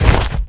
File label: dog footstep run; Garry's Mod